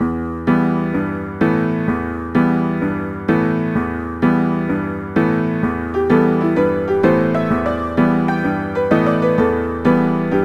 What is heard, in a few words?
Dance Jive Medium; knigge spielend zum guten benehmen; nds